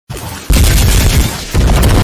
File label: gun machine blows